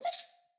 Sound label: Mobile Message; Game rip; MiSide